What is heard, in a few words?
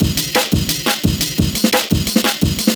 DNB BREAK; m8 breaks